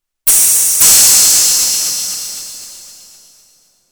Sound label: Crash with Novation PEAK